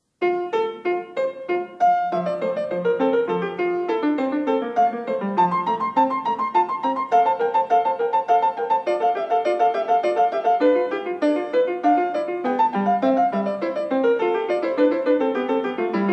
Two part invention for keyboard No. 8 in F major, BWV779; Klassika; Bach, Johann Sebastian